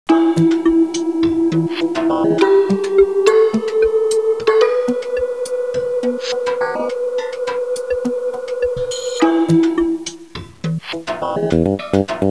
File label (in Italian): musica tecno; techno